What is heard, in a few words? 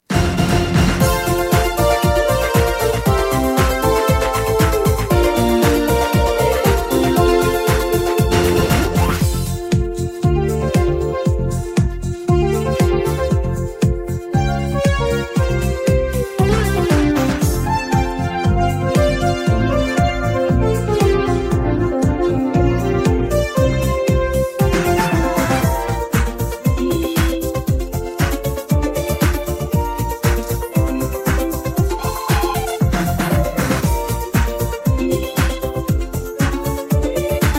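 vocal group, backing track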